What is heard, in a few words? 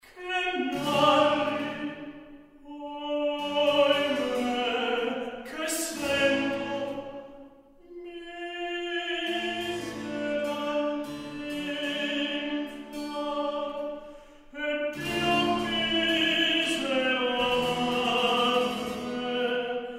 Dialogue in recitative; Por quel vago boschetto; from le musiche sopra l'euridice; Jacopo Peri